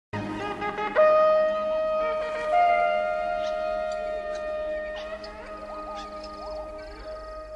Horns; Ewok

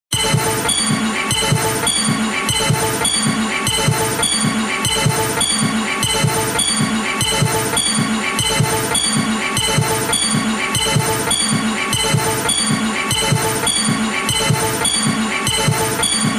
Loop

